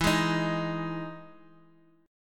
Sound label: E Major 7th E down; EM7 strummed; JGuitar